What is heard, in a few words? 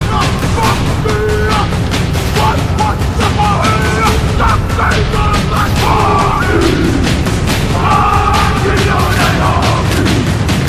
epic ka mate haka; audiomachines; manticore